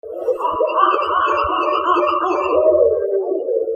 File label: barred, owl